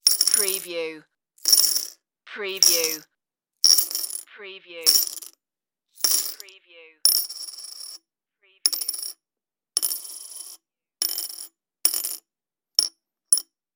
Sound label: put coins on a plate